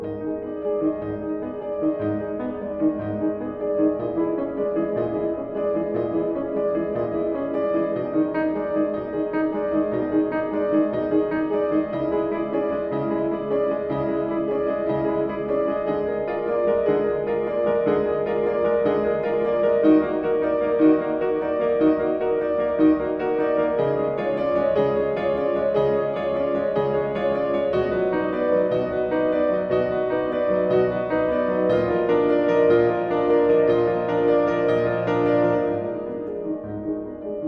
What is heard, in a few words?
Canto Ostinato, Minimal Piano; Section 60